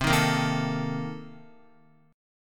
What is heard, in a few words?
C Minor 13th C Guitar; Cm13 strummed; JGuitar